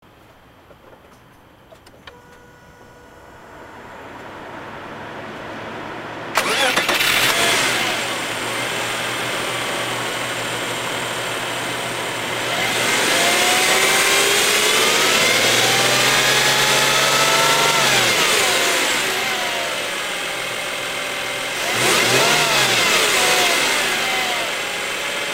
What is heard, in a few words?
3000rpm ENG Room; 30RspecB